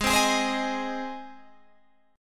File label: G sharp Major G sharp, chord